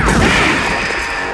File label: gasp; Alien Arena; lezard